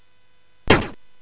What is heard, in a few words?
Dark Castle, CSG8580R5